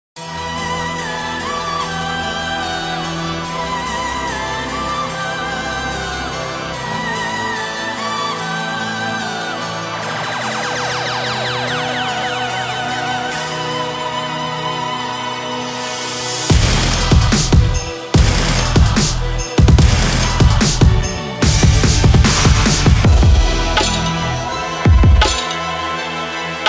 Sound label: Deutschrap Drum Kit; Vol. 1-4 COMPLETE Bundle